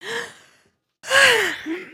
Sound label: yawn